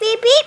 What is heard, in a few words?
ribbit